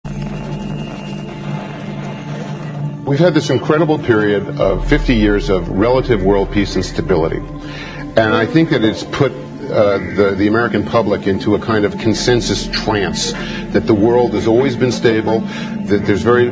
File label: trance